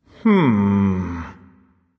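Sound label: thinking, nonverbal; smite; khepri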